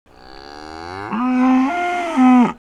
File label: Oestrus heifer